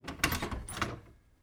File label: Door Basement Open